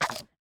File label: Raptor Bite Sounds